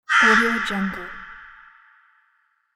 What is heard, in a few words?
horror crow; zdravinjo